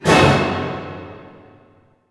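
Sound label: F# diminished fortissimo, orchestra hits; Sound On Sound - Peter Siedleczek's Orchestra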